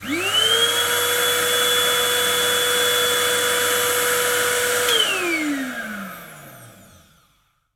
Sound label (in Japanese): 掃除機の音